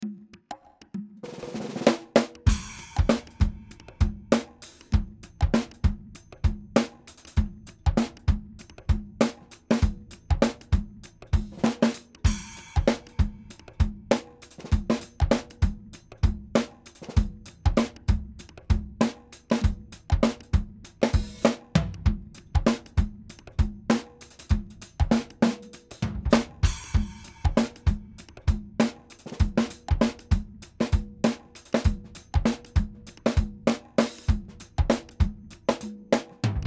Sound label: loopdrums; studio